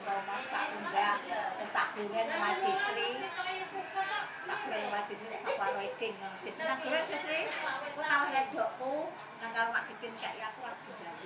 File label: background sound from traditional market; Ngasem